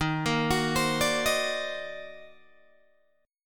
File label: D sharp Minor Major 13th, Guitar, DADGAD; arpeggiated; 13,13,16,17,17,13; chord